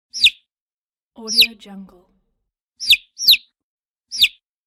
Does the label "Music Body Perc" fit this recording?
No